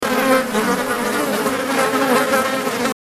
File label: bees buzzing; juicycorp